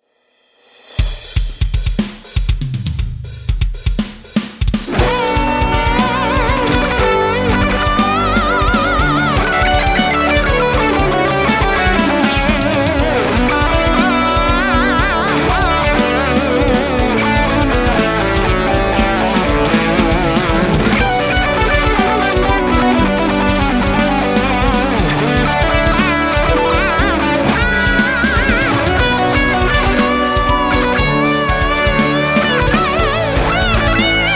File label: backing track